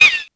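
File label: Dilo Call, dinosaur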